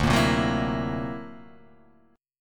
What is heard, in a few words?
D Augmented 9th down, guitar